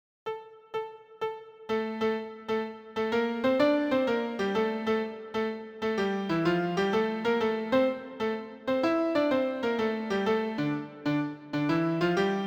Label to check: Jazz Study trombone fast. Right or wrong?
Right